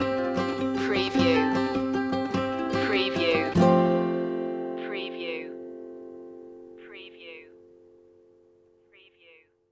the nu-folk tune stinger

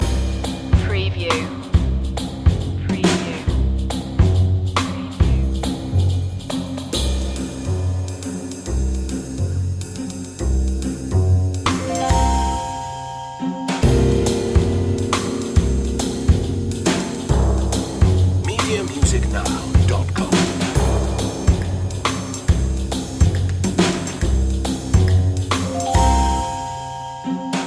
house of the creeping sounds loop

